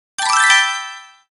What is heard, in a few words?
goal player team